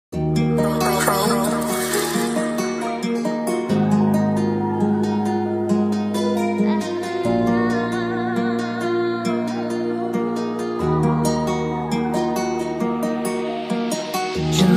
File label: extreme bass boost